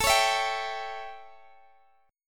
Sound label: A 7th Guitar Open D, chord